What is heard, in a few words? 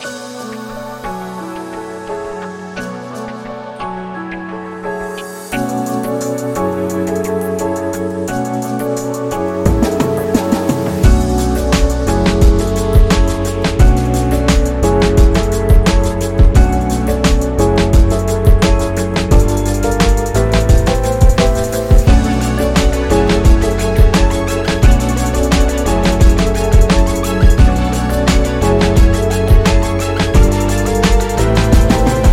Hip Hop Advertising; medical background